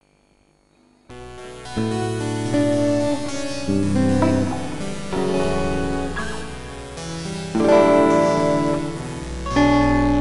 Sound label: Two guitar lines with different rakarack effects